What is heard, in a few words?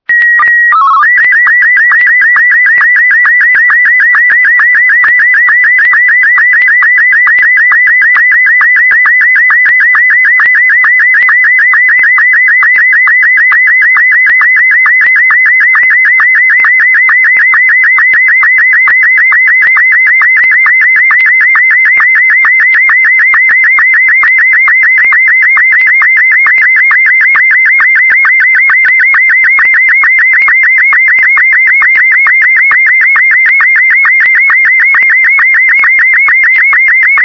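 digital SSTV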